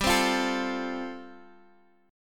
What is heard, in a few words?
G 7th Suspended 2nd Suspended 4th, G, Guitar, Standard; G7sus2sus4; strummed; 15,15,15,14,x,x; down; capo=3